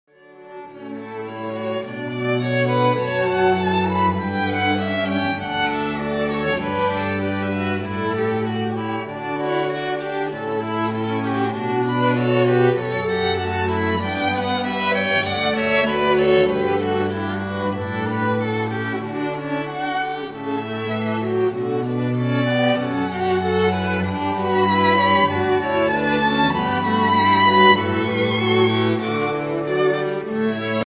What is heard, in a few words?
canon